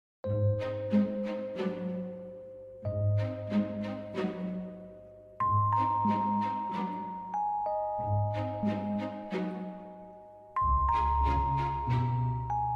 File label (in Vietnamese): nhạc nền âm thanh tò mò